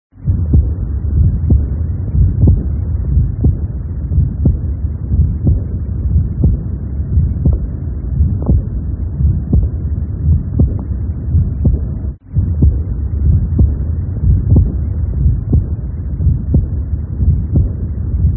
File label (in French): regurgitation aortique, Coeur